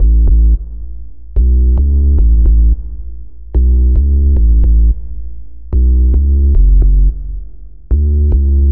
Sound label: verse bass, remix